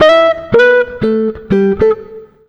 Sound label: 120 JAZZ, Electric & Acoustic Guitar Loops; USB Soundscan vol.04; AKAI